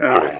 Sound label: damage